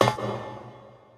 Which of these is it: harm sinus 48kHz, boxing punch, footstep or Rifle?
footstep